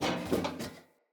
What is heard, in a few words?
Corrupted Battle Dog Foley Fill